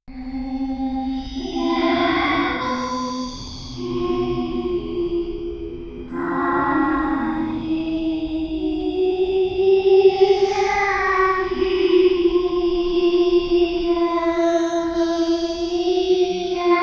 creepy childrens, abandoned house

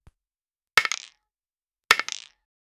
the sound of dice landing

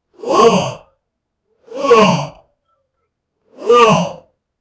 deep strained male grunti, exercise

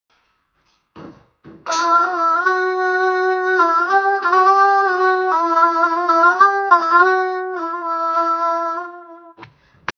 raqs sharqi belly dancer, ethnic

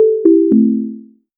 distinctive, notification

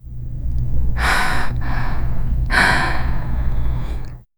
the sound of a girls, female voice

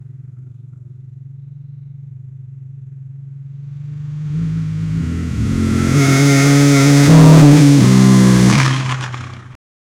cinematic motorbike engine

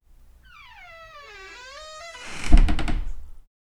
a door creaking open in

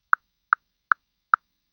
a dull lower pitched tap

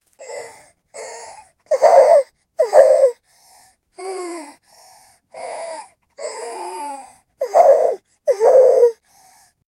tiger growl woman breathi; baby crying

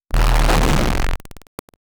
wrong sad

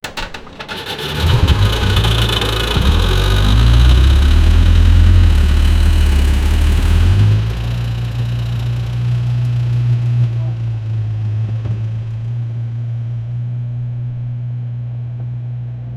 Bolero jeep coming and

